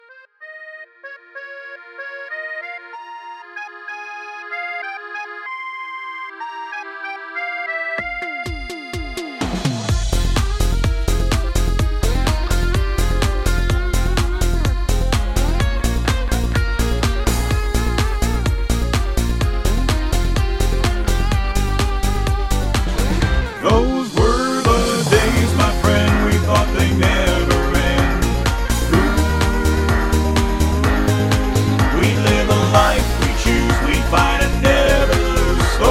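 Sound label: Instrumental with background vocals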